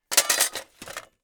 Thin metal plate drop

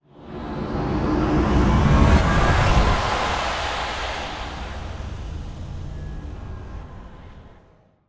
Futuristic vehicle flying

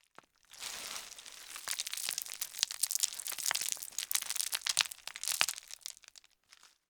Stone debris falling

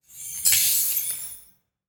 Magic sparkle touch; ao thuat

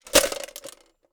Metal tools box browsing

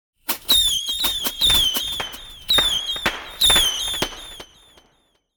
Whistles firework echo and explosion; ban phao hoa

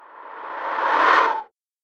Loud creature breathing

